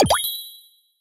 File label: Item Stock Got Coin